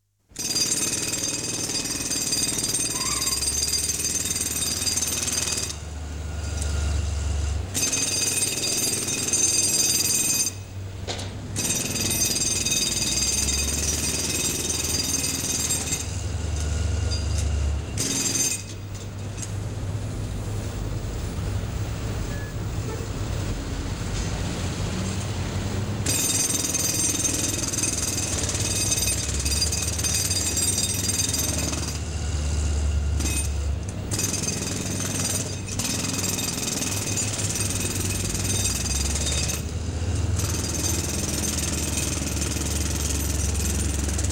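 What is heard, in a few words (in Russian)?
пневматический молоток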